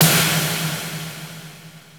Fairlight CMI III Splat

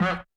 percussion, Roland TD-20

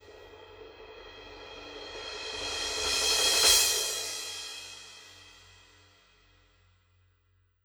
Cymbal, Orchestral Percussion One Shots; Sonic Foundry (Sony Creative Software); Orchestral VOL-2

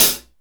ROK LOOSE, HIHATS

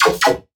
synth hit come again; Virtual Riot

